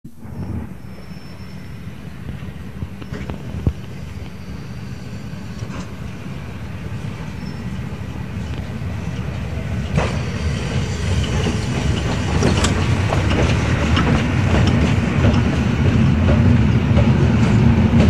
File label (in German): Gespann; alsdorf